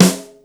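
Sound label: snare mid, Yamaha Motif ES drum machine; 300 Drum Machines; Yamaha Motif ES-Series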